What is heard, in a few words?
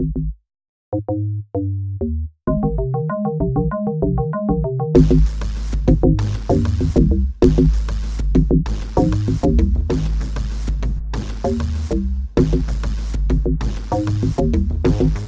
Random Beats but No Bass